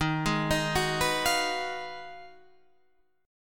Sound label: Ab Minor 13th, Eb, guitar chord